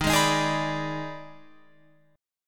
D sharp Major 7th Suspended 4th Sharp 5th, down Guitar Open F; D#M7sus4#5; strummed; chord shape